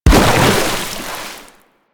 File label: Nechro Mech Step Surface Water; Step Surfaces